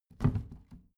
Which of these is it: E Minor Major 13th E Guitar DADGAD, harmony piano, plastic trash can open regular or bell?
plastic trash can open regular